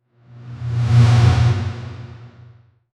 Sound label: spectral whoosh